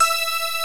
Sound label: MULTI SYNTH